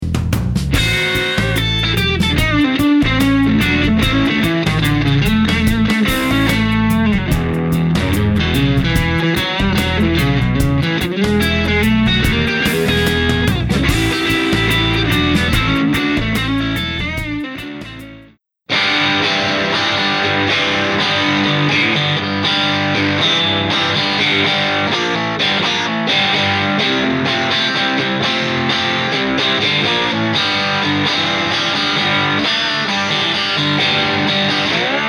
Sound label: sound check; fdr-1